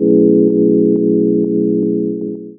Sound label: Chords Tones; Equipped Music; Loungin House